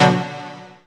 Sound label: Synths H; Vengeance Samples